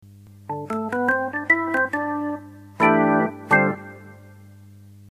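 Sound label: organ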